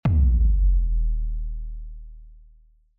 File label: Boom